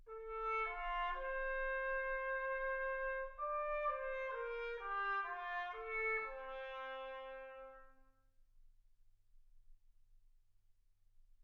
trumpet

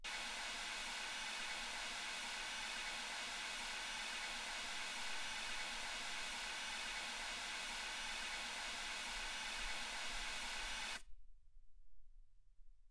mls16 48kHz

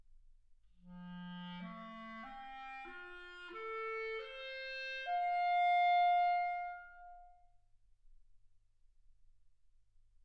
clarinet

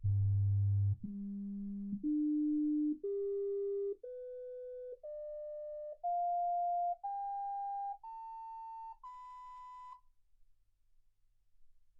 sinus tones 48kHz

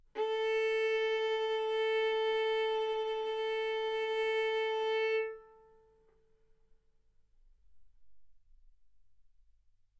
Violin arco mf sul A A4 B4 stereo

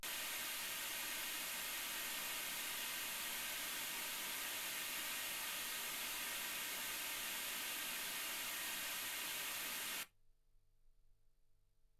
wgn 48kHz

